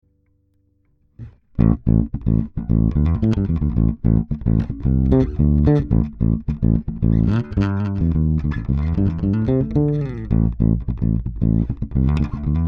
goldmike mk2 Bass Tube Amp

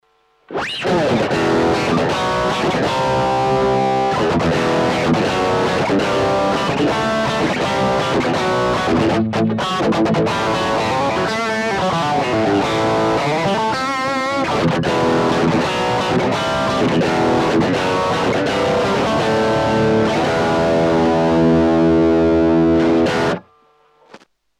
Blackstar LT Echo 15

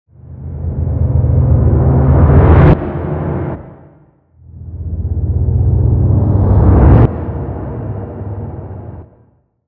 Rises

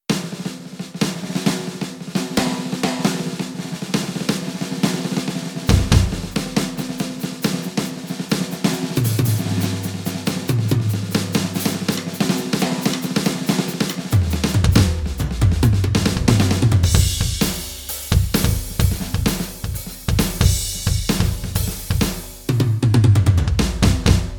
American Vintage

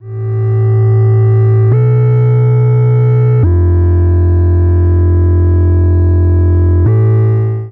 Behringer Neutron Sync Bass

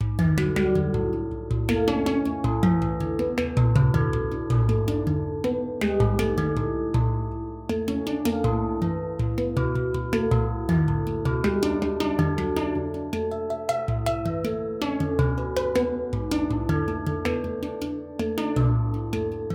pan drum chrom

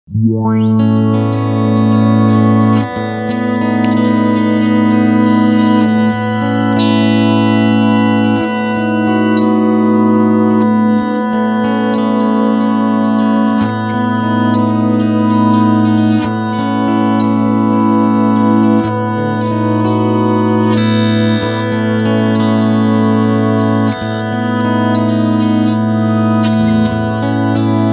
MOOG

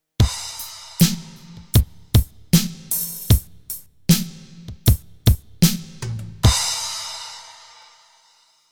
Standard Drumkit; AKAI MPK Mini Play Mk3

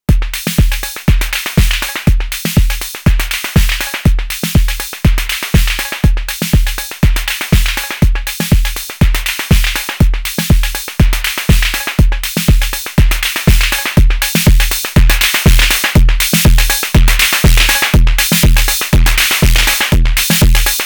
Arturia DrumBrute Impact Pattern

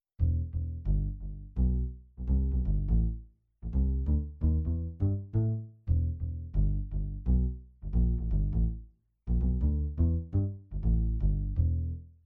ES-110 Bass

